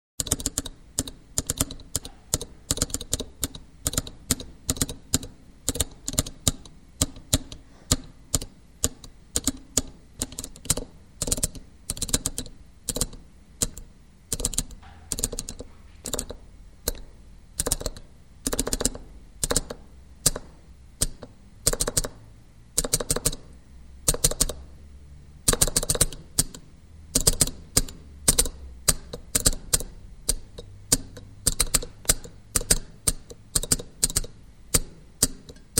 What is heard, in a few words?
Telegraph Click Sounder; SOUND EFFECTS1